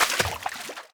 water; firefight

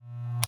Electronic, Latch, click, heavy, clippers, off; Eiravaein Works; Vaeyan IV

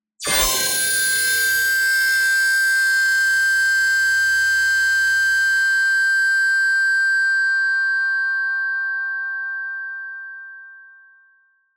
PING Electric Glare; boom library; Neon

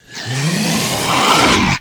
charge; Apotheorasis

